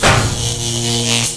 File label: Aluminium Shelf, Collisions; XBOX; Furious Karting; Decors